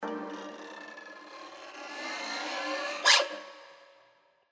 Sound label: koto